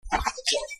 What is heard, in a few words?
noise reduced